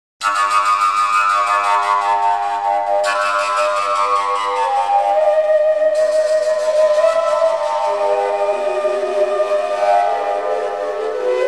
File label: traditional, fujara